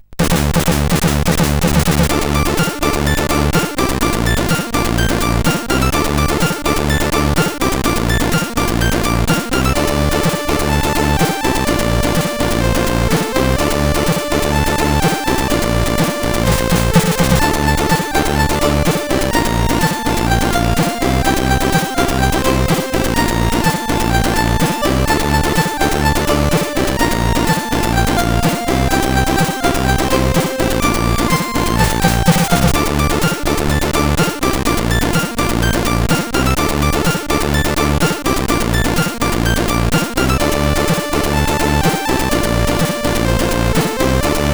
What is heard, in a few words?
Trip, CSG8580R5